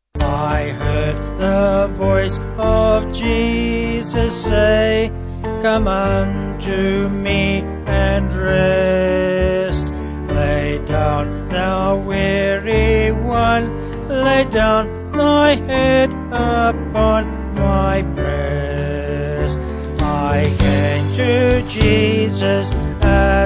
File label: Vocals Band; I Heard The Voice; Vox Dilecti